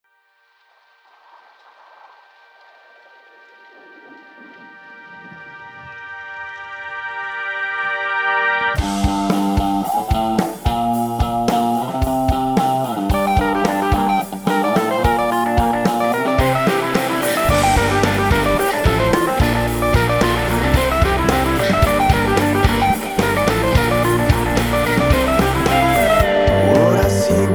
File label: ROCK